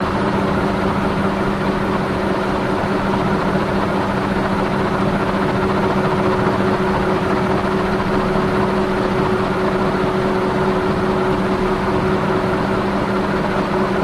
Fan Rvrs Strt Slw Dow, fans big; Fan Reverse Start For Slow Down

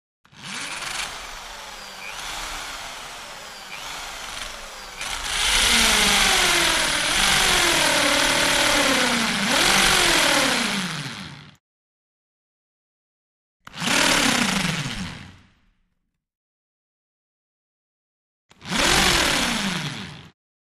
Drill Impact Run, hand drills

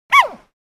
Panda Bark Single

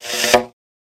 Tube Slide, Hit

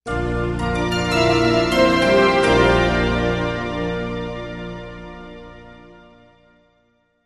Music Logo; Electric Chimes, With Long Reverb.

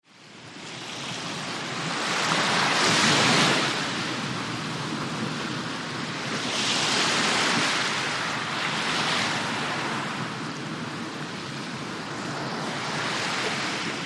Lht Surf Heavy Surf BG

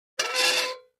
Metal Scrape On Metal

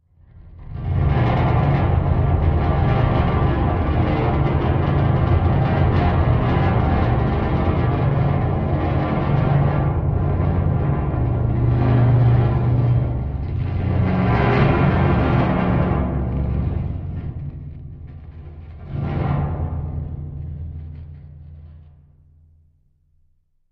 Dry Ice; Eerie Distant Metal Fluctuating

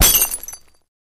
Glass Crash Sml Impa; windows & mirrors

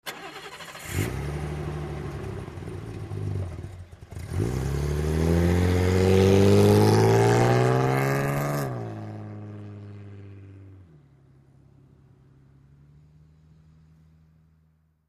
Bmw 530i Start Medium

